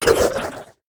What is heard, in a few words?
worm death; factorio; Factorio 0.14.21; creatures